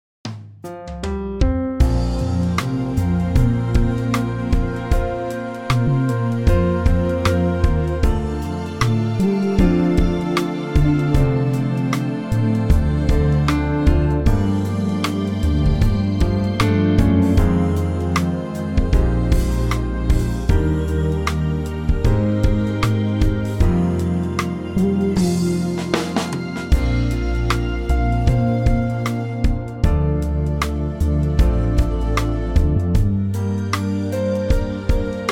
Bb smooth

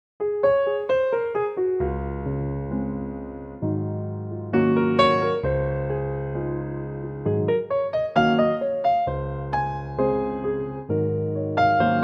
piano Db

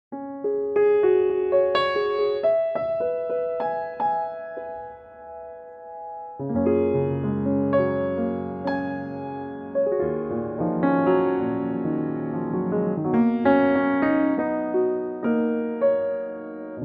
Gb piano

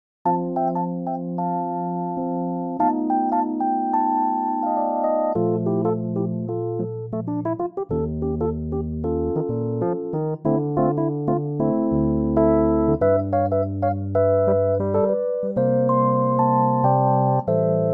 F Electric Piano; key - F - vocal range - F to D; Fender Rhodes piano

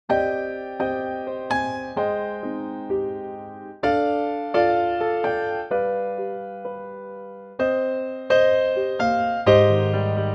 Cm piano; key - Cm; Pianotrak; Beautiful piano only arrangement

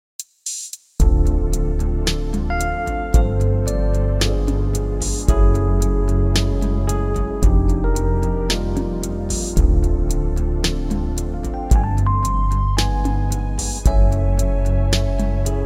smooth Bb; key - Bb - vocal range - F to A; Smooth arrangement of a wonderful classic standard

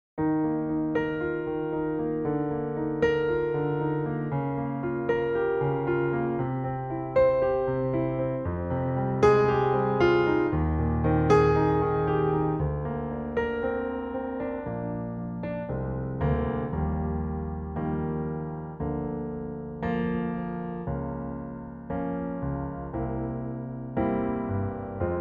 Eb piano

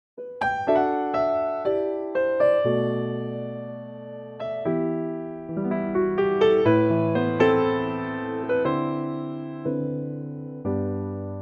G piano trak; key - G - vocal range - G to D; Very lovely piano only arrangement

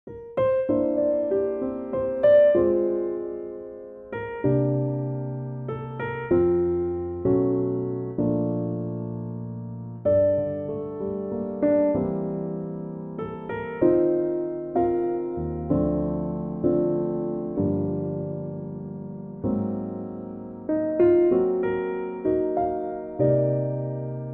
piano

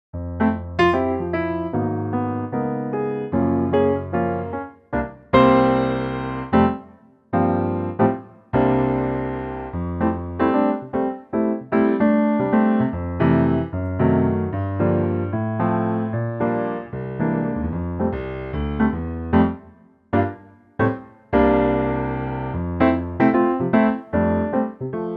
Piano; key - F & Bb vocal range - C to D

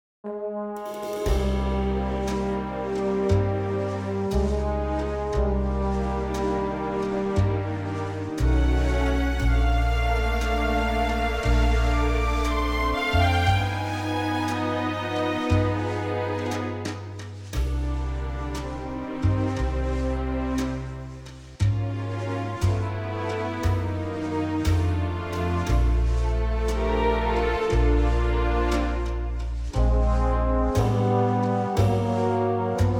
Bb orchestral; key - Bb - vocal range - C# to Ab

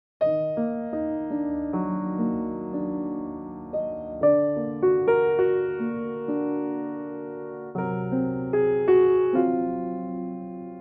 Bb piano; Bb Pianotrak; key - Bb - vocal range - Eb to Ab

